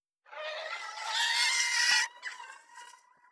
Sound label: Hydra Vo